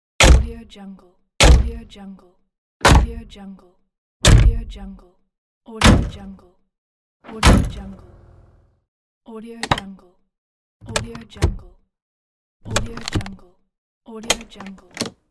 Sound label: car doors